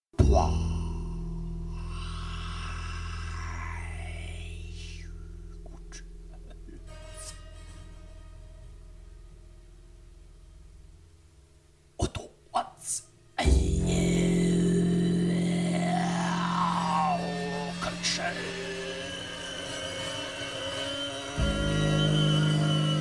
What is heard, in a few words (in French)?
FOIRE train Fantome